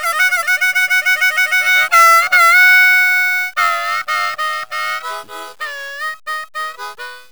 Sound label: harmonica